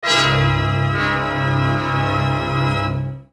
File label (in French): Puzzle Dramatique Peur